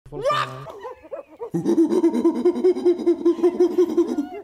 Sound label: "uhuhu"